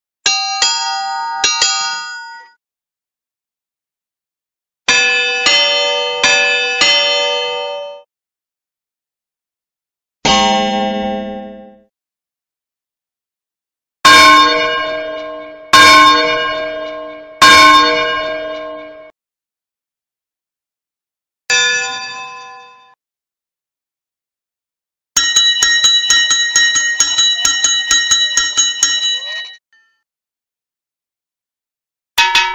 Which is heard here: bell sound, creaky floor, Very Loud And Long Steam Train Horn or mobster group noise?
bell sound